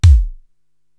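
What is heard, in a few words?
FM E.Tom, electronic tom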